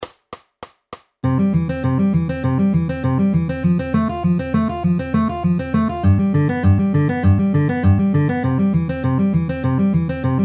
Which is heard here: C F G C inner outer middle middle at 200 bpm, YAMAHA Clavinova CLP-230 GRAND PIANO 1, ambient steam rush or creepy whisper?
C F G C inner outer middle middle at 200 bpm